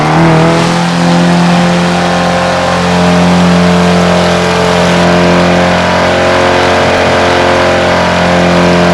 gear, vehicles; tdmcars; mr2gt

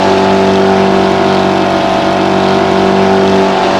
evox mid, vehicles; tdmcars

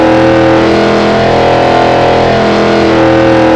mid, vehicles; hsvgts; tdmcars

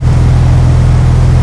rav4 idle, vehicles cars; tdmcars

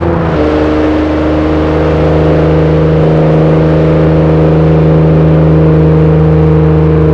rav4 gear, vehicles; tdmcars

